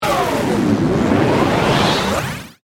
Stinger Ascend, Production, Element, Stinger, Ascend, Descend, Distortion; BlastWave